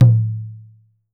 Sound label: IYA, Definitive Percussion AFRICAN SET; Voice Crystal - Steve Reid Definitive Percussion